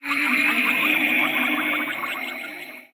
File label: laff steal